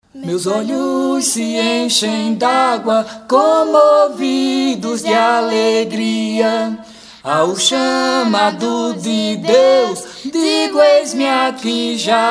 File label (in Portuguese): canto a capela